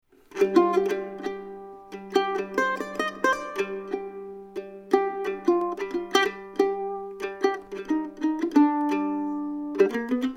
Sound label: Mandolin; Magneto; Room machine set to Studio, with medium predelay.